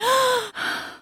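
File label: Realistic Fatigue Voice, female; oblivion; Imperial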